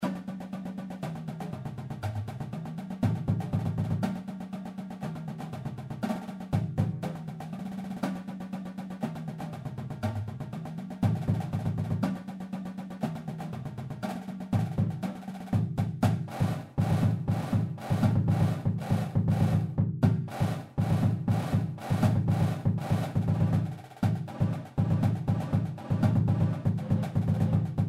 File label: Cadence